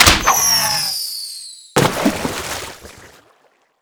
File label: Game Over Sign Fall